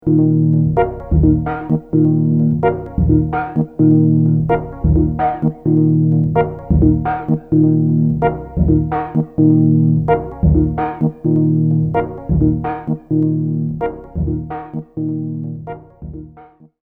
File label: MFB Dub